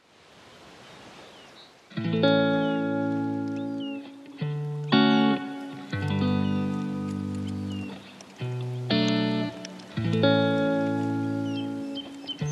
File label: lofi hip hop